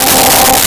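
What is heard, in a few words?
Distortion Guitar C5; Nintendo; July 2020 Gigaleak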